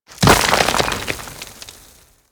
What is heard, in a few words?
Step Rock Debris; Enemies; Steps; Warframe; Corpus; Arachnoid Camper